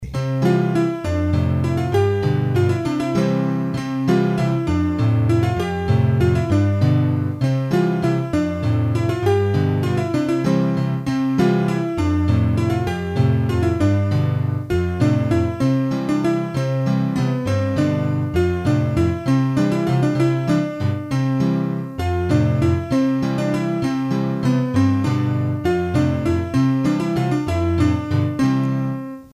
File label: Bourree, hurdy-gurdy, Auvergne